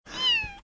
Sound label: meow